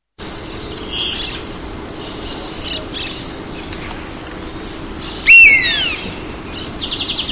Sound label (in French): buse cendree